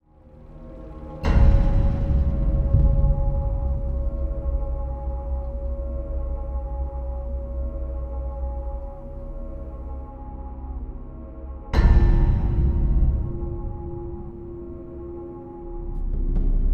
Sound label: Atmo Scary